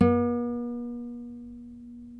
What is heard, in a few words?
NYL NV C, nylon no vib guitars